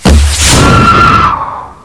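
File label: crossbow fire accel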